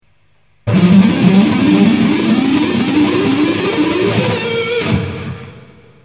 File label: picking